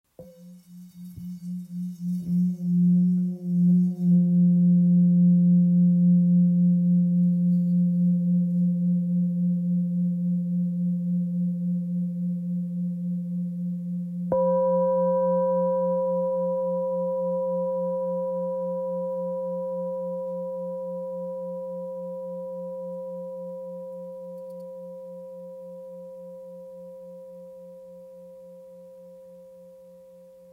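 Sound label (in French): Bol full moon si, bol chantant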